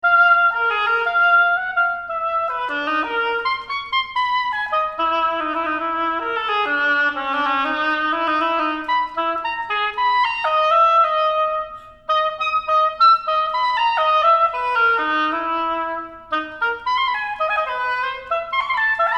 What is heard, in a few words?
Prelude for Solo Oboe